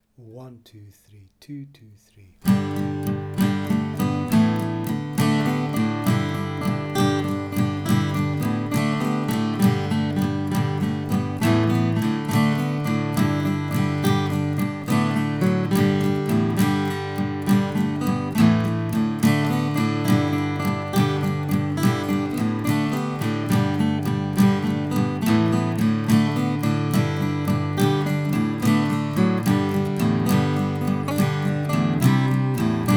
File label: The Kesh Jig Accompaniment; slow session